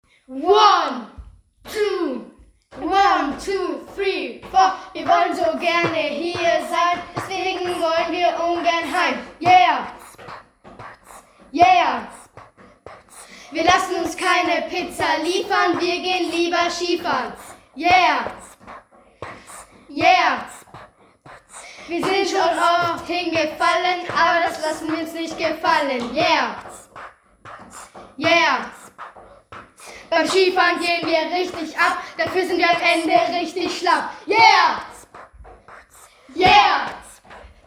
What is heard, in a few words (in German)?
Rap; Schiwochen